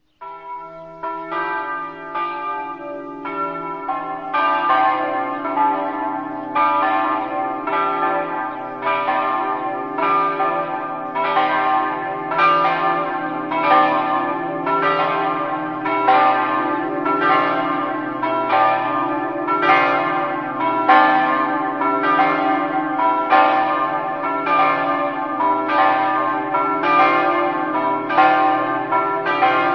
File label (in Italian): aiello, campane